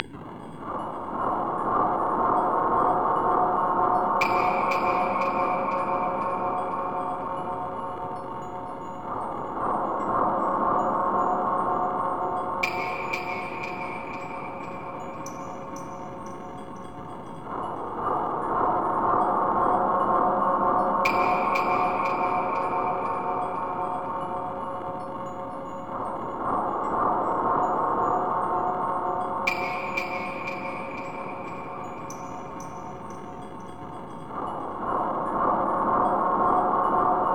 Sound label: Cavern Ambient Noise; Chuck's Zombie Petting Zoo; Marr0w Productions